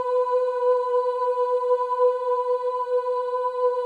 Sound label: Oo B4, Choir; Spectrasonics; Symphony of Voices